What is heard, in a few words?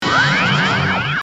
Klingon Ship Disruptor; Soundboard; arglyle